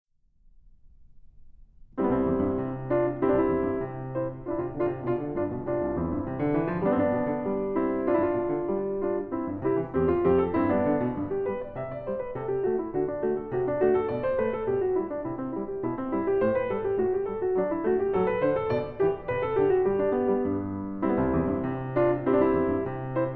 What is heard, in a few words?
Short Prelude In C Major; Magrath Book 07; BWV 933